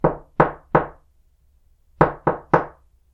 being knocked

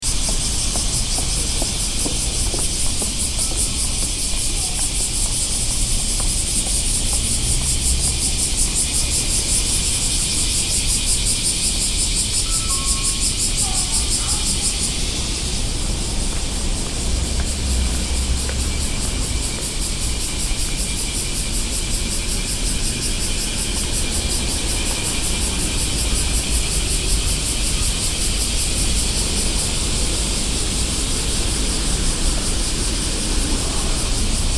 summer city

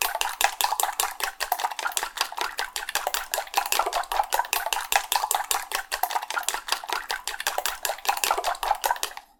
mix egg; cooking

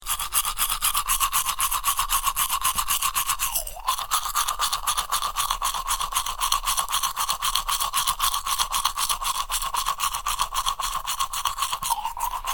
brushing teeth; human

